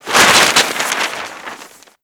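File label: destruction news stand paper; Explosions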